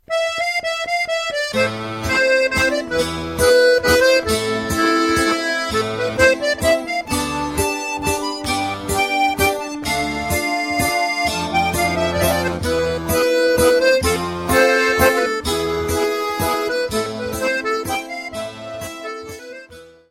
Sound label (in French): Valzer - Italie - Sicile; Valzer di Pinocchio